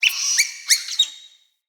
Summon Squeak, Animals, Vocals; Floppet

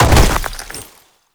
Land Heavy Ice; Movement; Warframe; Uriel

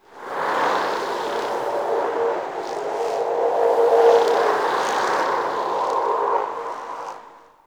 Wind Gust Med, Venus Landscape; Warframe; Venus Open World; Ambience

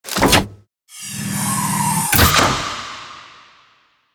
Archwing Space Extraction Tenno Docking; Archwing Spack Docking

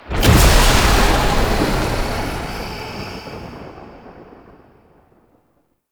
Mirror Defense Rune Despawn; Warframe; Entrati

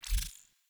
UI Default Theme Dialog Open

